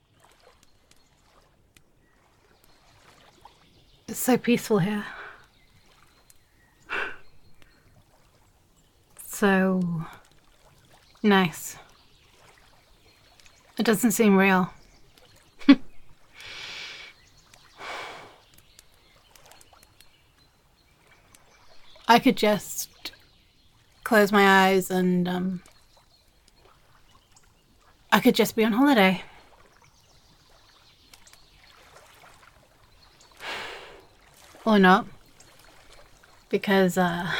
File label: Lakeside Ambience